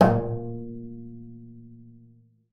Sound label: BING A#2, BASS FINGER; Xsample VOL-5